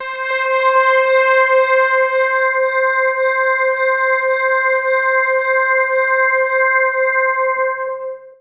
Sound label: Harmonic High C, Bright; Trance Formation